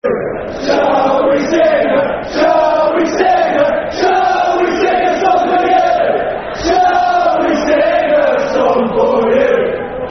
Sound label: Football Chant; Shall We Sing A Song For You; Cheltenham Town Ctfc